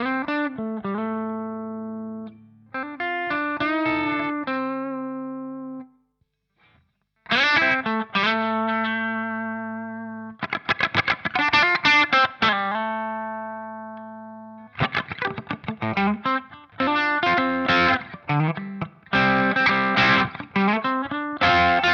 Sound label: Vox Valvenergy Silk Drive FX Loop